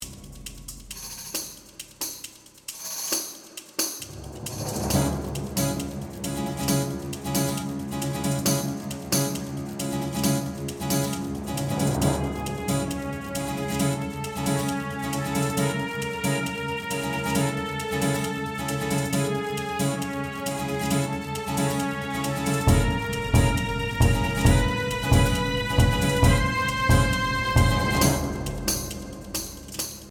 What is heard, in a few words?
Fast, Tamborine, Shakers, Acoustic Guitar, Strings, Western Chase